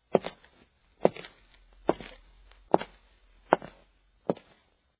Footfalls Dry Leathe Male; footsteps

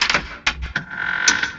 open creaky door; doorSounds

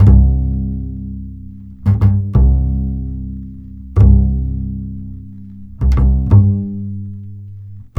Cool Upright Bass

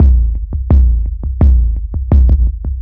808 Loop BD 85, Roland 808 Drum Loops, 85bpm

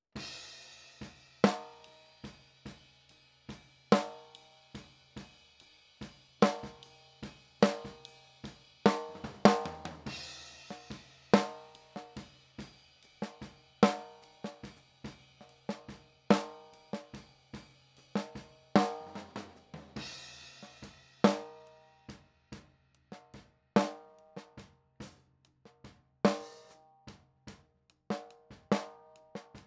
Snare

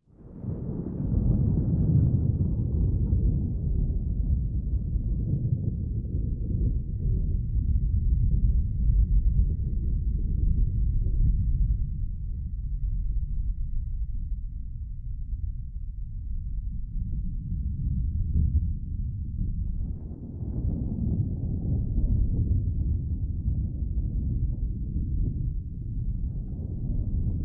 Thunder Rumble (No Rain)

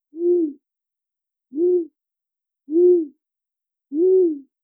owl 4 hoots; animals

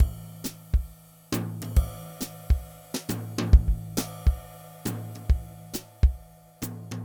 Lounge Jazz Drums, loops

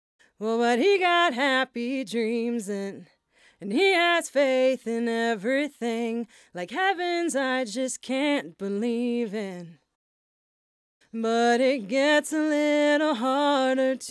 Lead Vox

